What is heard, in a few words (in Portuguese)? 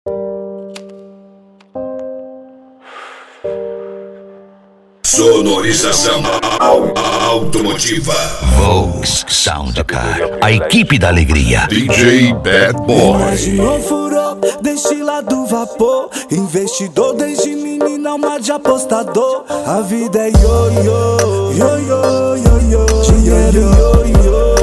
sound car hip hop nacional; cd volks sound car